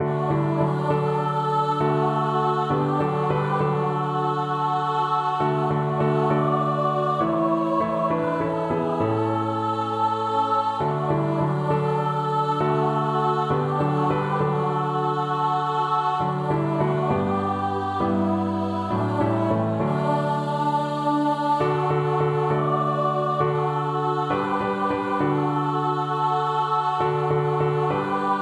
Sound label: choir